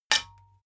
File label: sword metal hit rattle